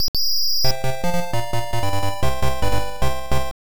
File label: Goal; Tehkan World Cup